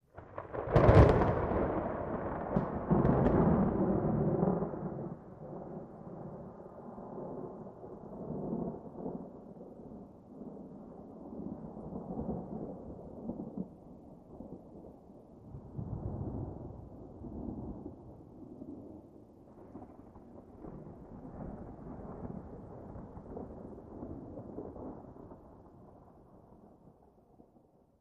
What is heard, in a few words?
Thunder Close